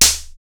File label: XTRA PERC